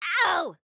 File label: Hurt; Harley